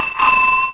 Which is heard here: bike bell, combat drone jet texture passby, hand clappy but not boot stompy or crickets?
bike bell